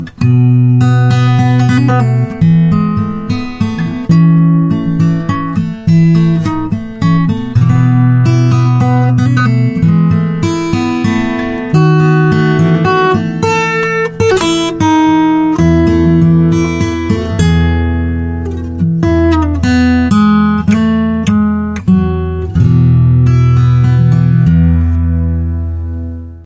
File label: silent night guitar